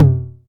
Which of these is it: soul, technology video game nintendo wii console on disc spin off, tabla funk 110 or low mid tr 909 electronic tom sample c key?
low mid tr 909 electronic tom sample c key